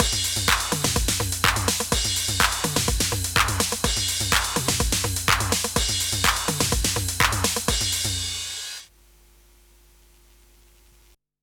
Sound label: TR-8 dbx off